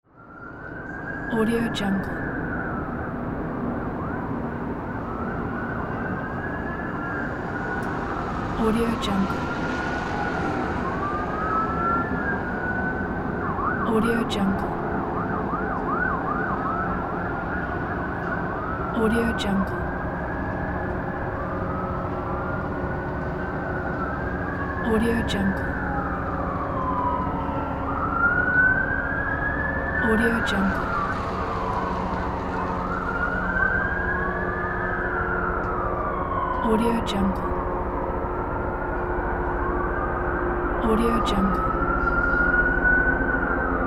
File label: emergency response